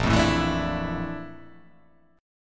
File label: A sharp Major Sharp 11th